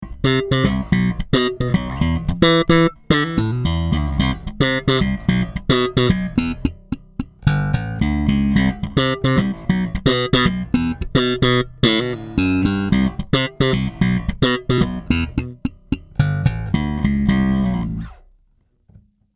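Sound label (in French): Slap Actif Tone 100, basses, Sadowsky NYC 5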